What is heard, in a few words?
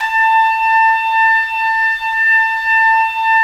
Trumpets; Roland - LA Composer vol. 4